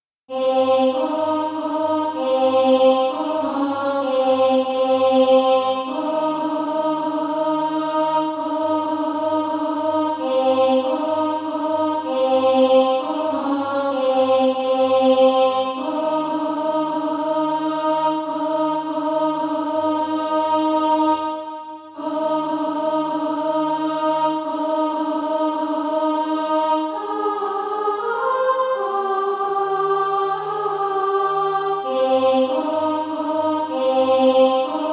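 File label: alto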